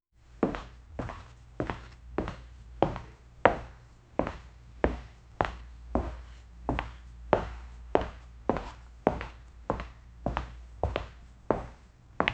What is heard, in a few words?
footsteps in tennis shoes